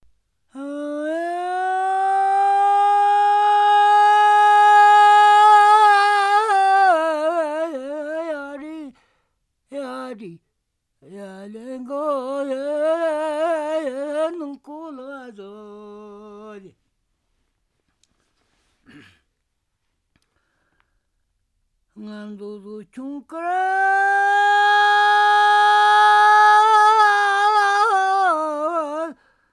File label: Stong skor Folk Song